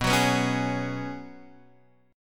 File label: Db 7th Suspended 4th B, guitar